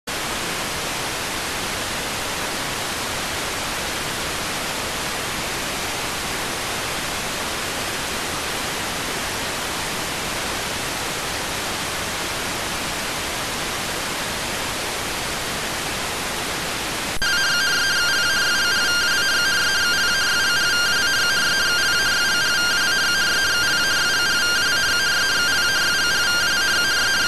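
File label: radioteletype receive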